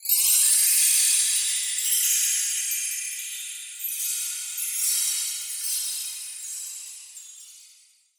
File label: shine